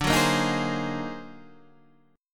D sharp Minor Major 13th D

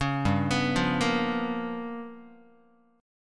G 7th Sharp 9th Flat 5th, Guitar; G7#9b5 arpeggiated; JGuitar

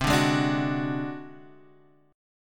Ab 7th Flat 5th C, chord, guitar

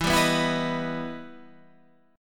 E Minor Major 7th E down Guitar Standard; EmM7 strummed

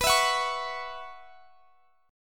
B Suspended 2nd Suspended 4th, Guitar, Drop D

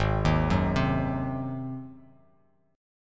G sharp 7th G sharp, Bass Standard; G#7; arpeggiated; JGuitar

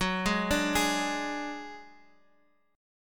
F sharp Suspended 2nd Sharp 5th; arpeggiated

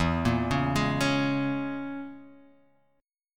E 6th Flat 5th Guitar Half Step Down

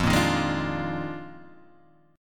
Eb Major 7th Suspended 2nd Suspended 4th F

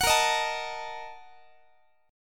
Bb Major 7th Suspended 4th Sharp 5th, Guitar; strummed

